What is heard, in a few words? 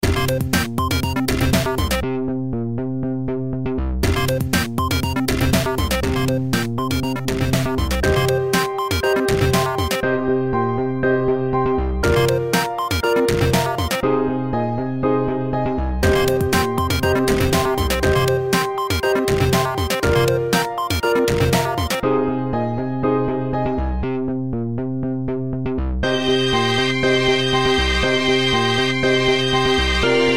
Bitcrusher